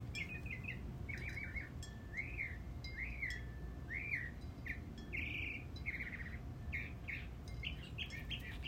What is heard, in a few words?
Birds garden